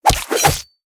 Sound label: Fight Attack Lance; Mighty Party